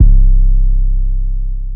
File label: 808s